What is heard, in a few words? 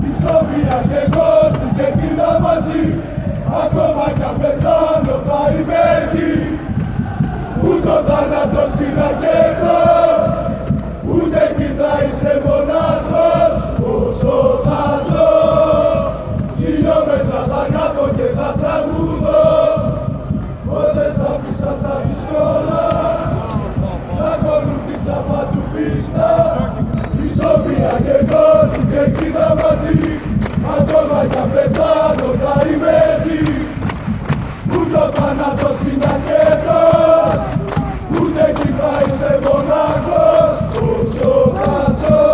aris chant